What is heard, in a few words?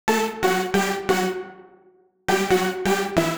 136bpm Lines n Loops Synths, uk garage samples